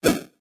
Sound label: dodge digital